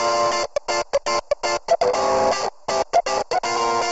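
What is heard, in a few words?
wet guitar funk, Multiband neural audio effect; Demo without amplifier; Wet-NoAmp; prism distortion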